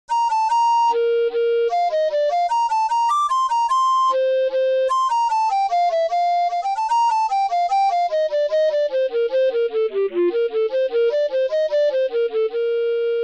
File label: Hornpipe, recorder